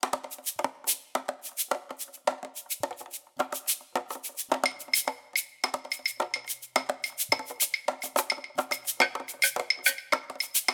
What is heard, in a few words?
Heritage Percussion